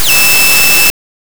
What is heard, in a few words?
ray